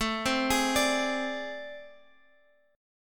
A Minor Major 7th Double Flat 5th, down, Guitar, Open G